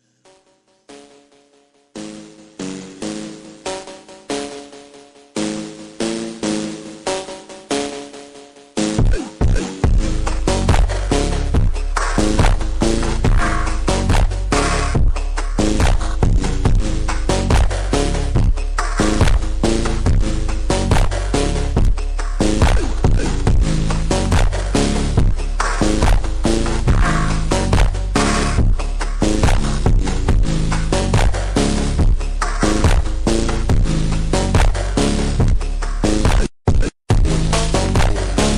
ultra slowed

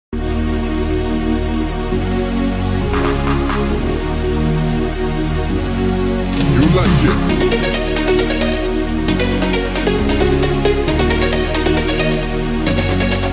techno

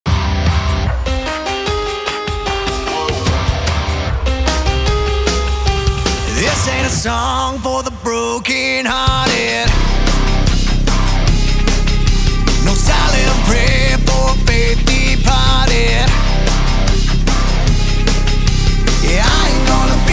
techno remix

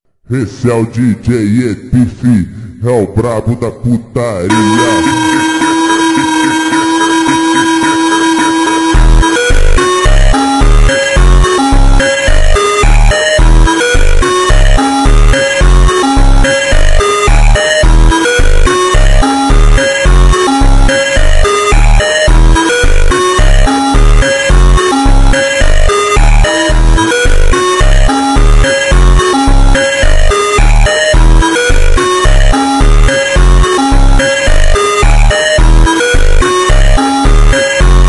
automotivo slowed reverb

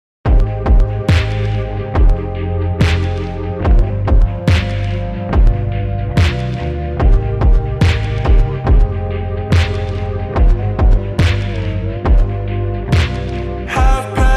slowed reverb

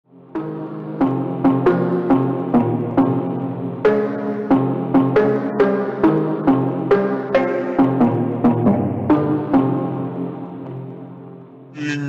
phonk extreme slowed

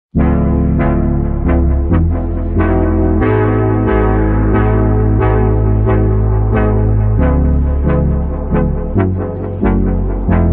slowed reverb

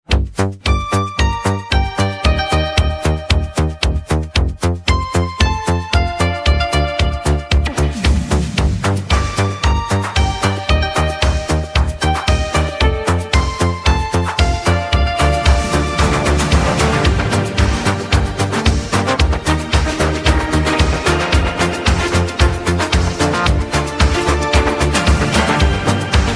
italo music

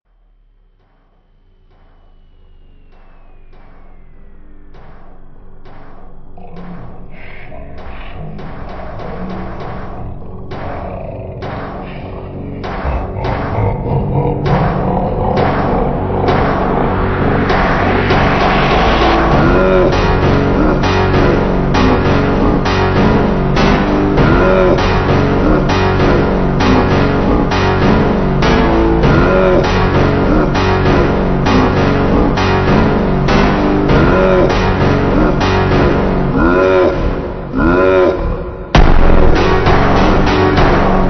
super slowed reverb

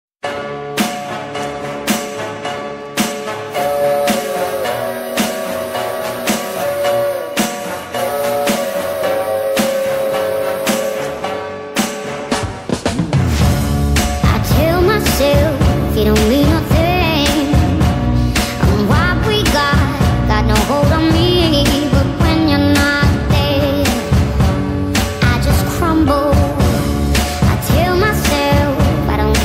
sped up reverb

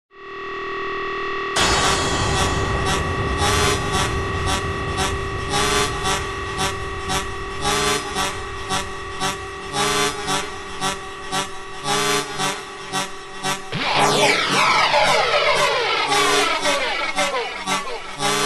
slowed remix

